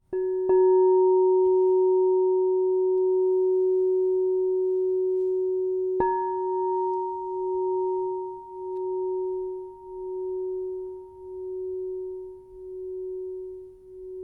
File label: Crystal Tones Singing Bowls